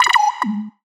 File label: Sci-Fi Lasers - Droid Talk; Antidote Advent